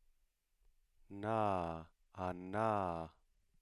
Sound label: voiced alveolar nasal